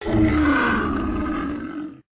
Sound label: ferocious roar